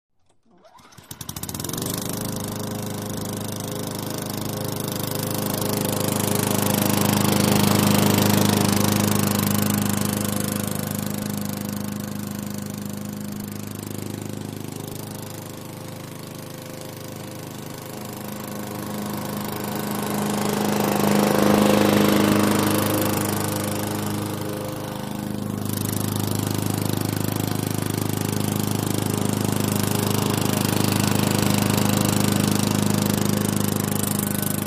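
Lawn Mower; Start / Movement / Off; Mower Starts, Moves, Turns Off; Comes Away And Near ( Left And Right ) Several Times, Then Off. Far To Near Perspective. Small Engine.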